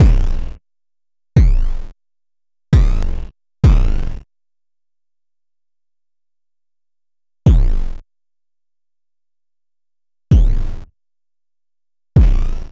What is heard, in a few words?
Rhythms BD; KIBO; KIBO Interferometer stems; bass drum